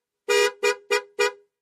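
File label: Aston Montego Horn Blow; aston montego ext. horns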